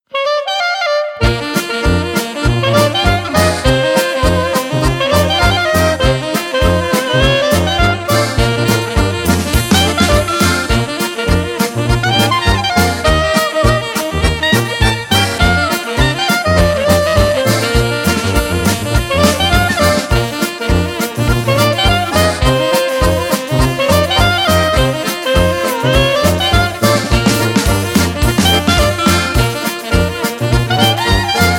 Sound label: Creole Jazz